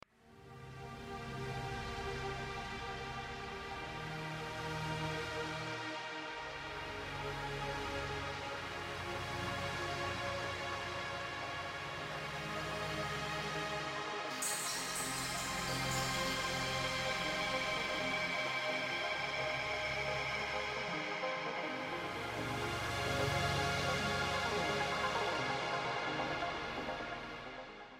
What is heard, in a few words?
progressive house